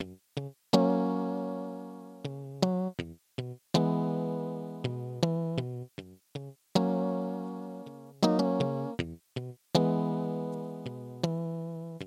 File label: keyboard stuff